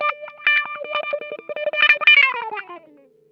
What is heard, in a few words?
TUMBLE, Funk Guitar; Zero G - Funk Guitar